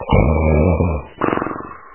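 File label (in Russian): храп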